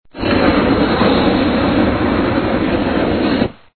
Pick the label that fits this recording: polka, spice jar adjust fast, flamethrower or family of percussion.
flamethrower